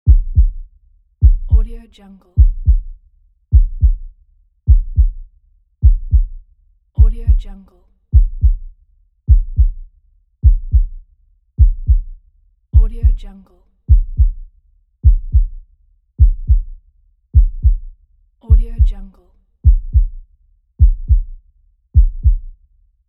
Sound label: heartbeat